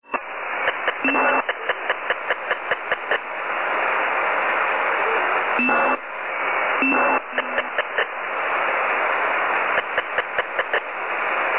A HFDL Signal which has been corrupted by some other transmission in the vicinity